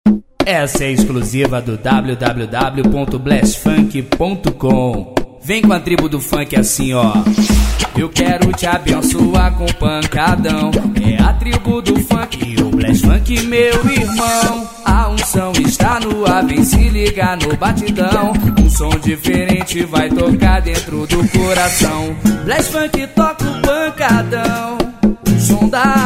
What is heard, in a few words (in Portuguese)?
pancadao funk